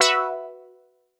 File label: Quart Stab Gb; PowerFX - Nu Jazz House